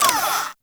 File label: Security Camera Stop; Ambience; Gameplay; Warframe; Grineer; Fortress